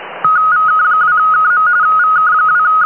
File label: rtty; hamradio